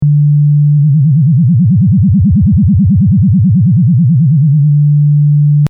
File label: lfo amount